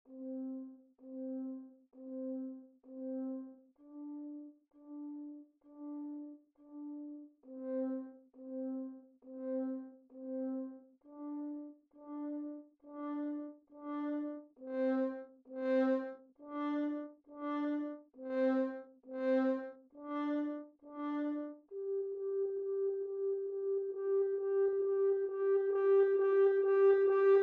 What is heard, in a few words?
Horn; Overture or Something